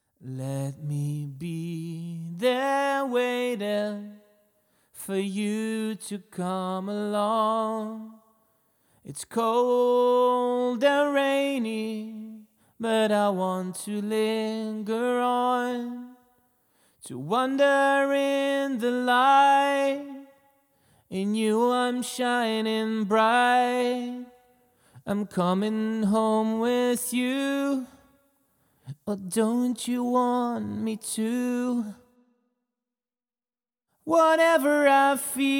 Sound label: Indie Pop 120 AM